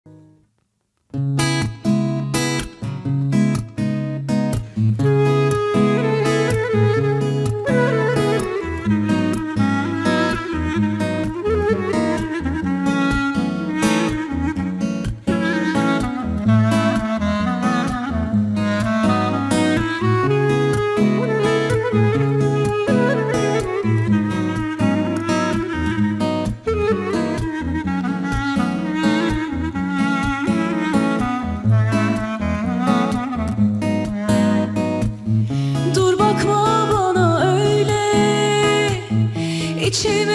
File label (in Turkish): akustik